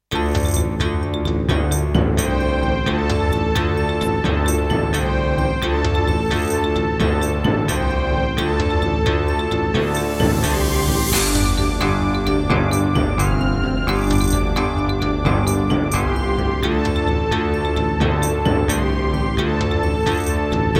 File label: Roland CM-300, wavetable; Heretic